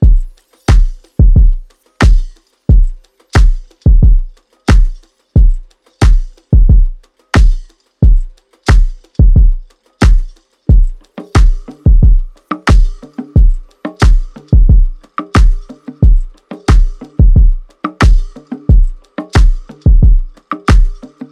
Kalimba Bounce Drums; Kounts Sounds of the Week (Episode 17)

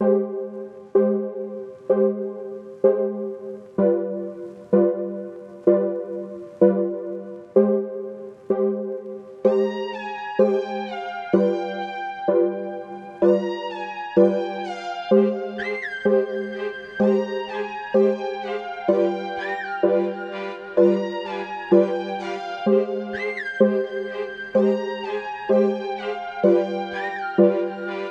Honkytalk Sample; Pocket Dial Sample Pack

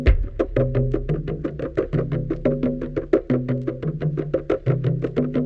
Dusty Conga Loop; Vignette Kit

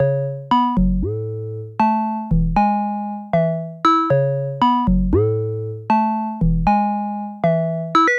202 loop; Romb Project

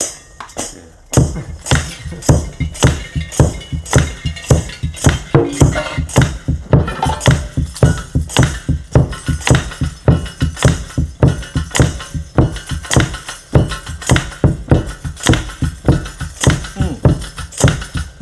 Perc Loop; Kount's Reel Break Library

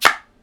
Natural Clap; Kounts Sounds of the Week (Episode 17)

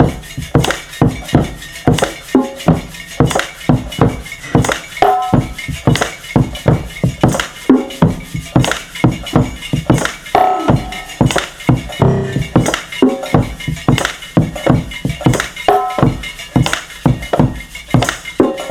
Perc Loop; Kount's Reel Break Library

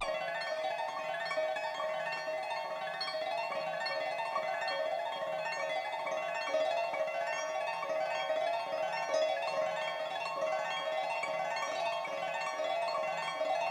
Guzheng Texture; Vignette Kit

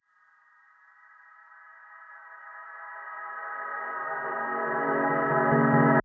Key Flourish rev; Vignette Kit

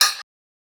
Fake Hat open; Vignette Kit

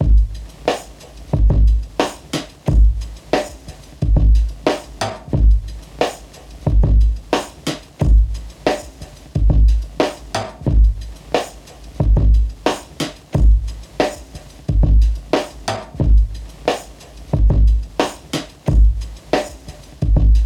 Slapback Tape Drums; Kount's Home Stretch Sample Pack

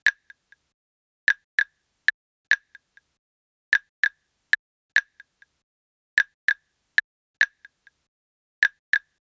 Glitch Clave loop; Vignette Kit

